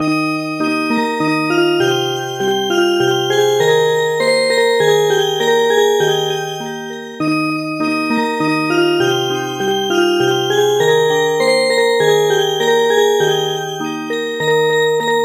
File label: Music Box; Heaven and Earth Rejoice Today